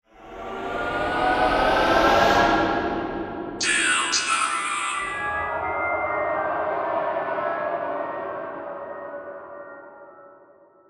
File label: INTRO NOISE; deltarune magyar lokalizacio